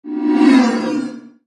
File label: spaceship